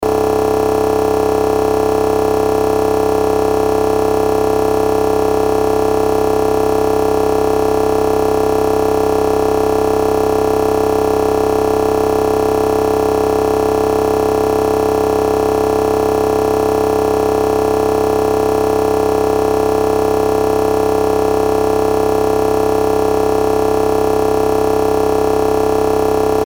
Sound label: Electronic Hum Noise Buzzer; Production Element Imaging Element Accent Transition